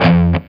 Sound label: F#3 SOLDANO ROCK, Guitars